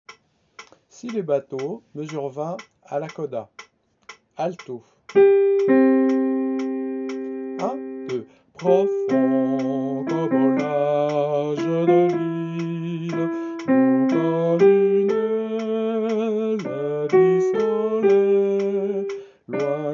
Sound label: coda alto